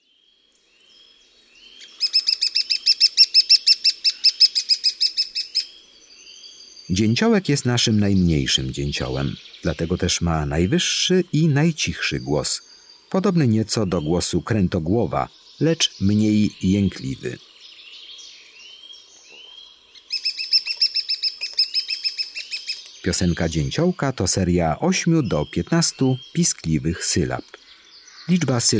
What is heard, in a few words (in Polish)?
DZIĘCIOŁEK, Ptaki; Kurs PTTK